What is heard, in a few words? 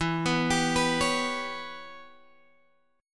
E Minor 6th Guitar Open F; Em6; arpeggiated; 16,18,19,18,16,x